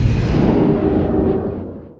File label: mage teleport; wow